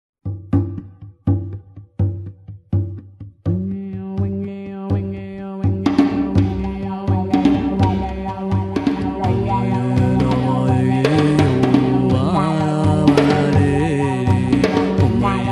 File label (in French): chant pour penser